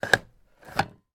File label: office hole punch; sound effects one